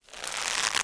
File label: rope creak, highwire; Ringling Bros. and Barnum & Bailey; Wii